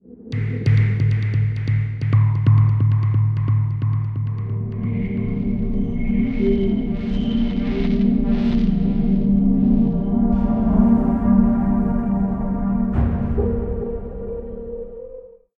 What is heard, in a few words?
Tubular Stinger; Scourge Outbreak